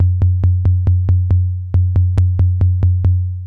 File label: SINE BASS, Techno 138 BPM; Best Service ProSamples vol.54; AKAI